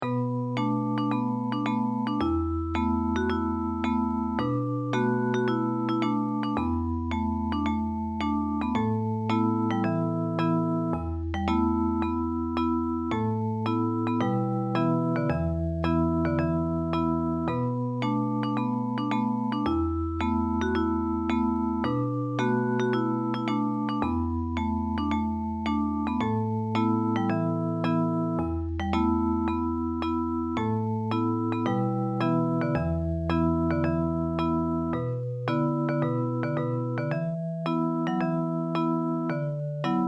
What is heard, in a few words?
japanese folk song; noe bushi